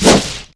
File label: Whoosh